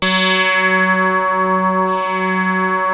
PPG F#3; Hollywood Edge - Giorgio Moroder Rare Synthesizer Collection; PPG.2.3

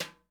brush; Northstar - New Gold VOL-2; 30S BRUSH

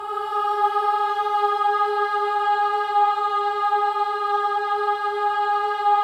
BOYS AHS, Symphony of Voices

